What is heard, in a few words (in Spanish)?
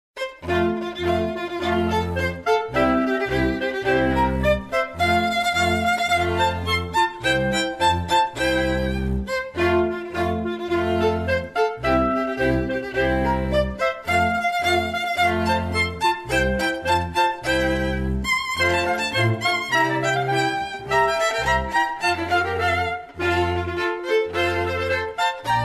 DINAMARCA Folklore Danés